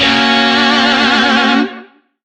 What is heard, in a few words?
Shawzin Hirajoshi Chord; Warframe; Lizzie Shawzin